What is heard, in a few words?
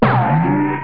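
large case; JOHNNY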